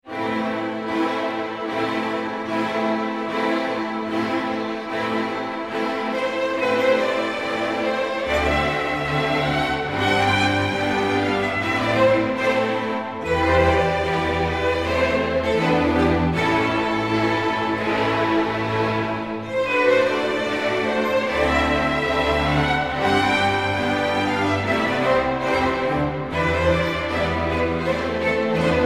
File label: Seven Romanian Folk Dances for orchestra; Bartok